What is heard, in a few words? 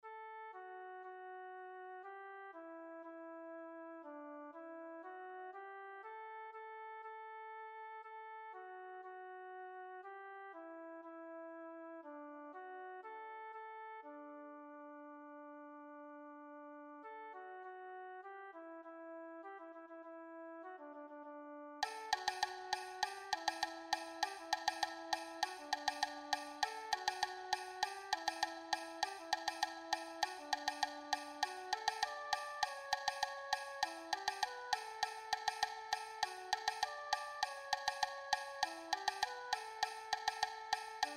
PERC